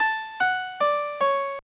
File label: Jingle; Puku Puku Tennen Kairanban Koi no Cupid Daisakusen; GBA